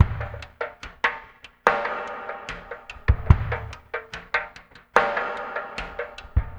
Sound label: LOFI; Spectrasonics; Liquid Grooves 1 & 2